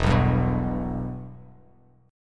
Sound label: E-Minor, Bass Standard; strummed; JGuitar